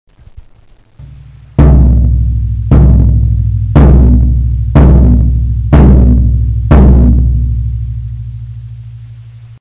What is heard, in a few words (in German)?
Trommel, Ton c